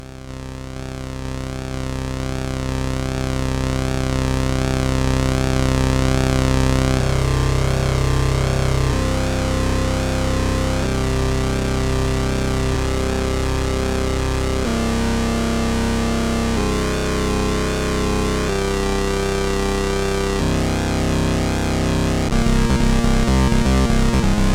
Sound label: Electro Gates sid CSG8580R5